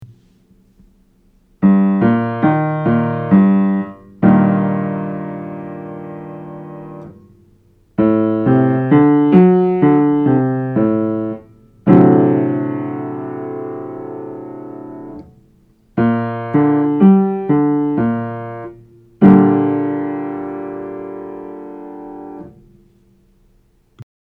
Diatonic Harmony; Aural Training exercise

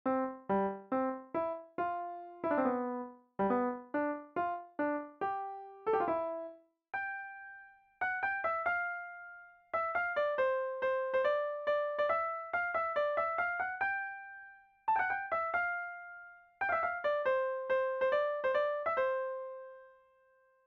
piano concerto melodic materials; Mozart

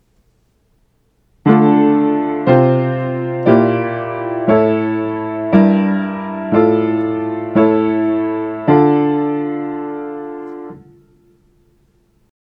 Harmonizing a Melody Aural Training exercise

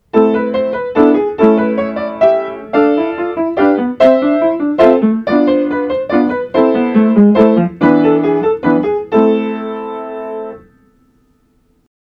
Harmonic Rhythm; Aural Training exercise

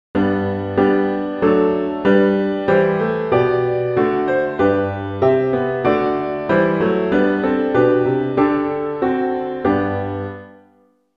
harm rhythm

